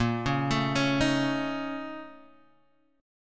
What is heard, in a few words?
A sharp Minor Major 7th Double Flat 5th A sharp Guitar Half Step Down